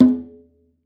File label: Conga Mid Open; SPD One Sounds